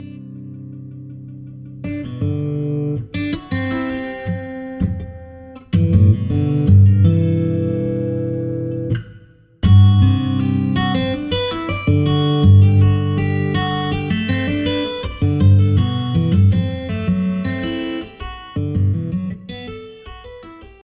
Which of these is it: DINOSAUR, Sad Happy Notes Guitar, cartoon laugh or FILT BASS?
Sad Happy Notes Guitar